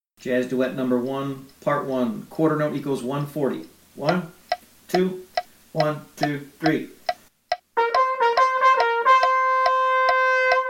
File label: jazz trumpet duet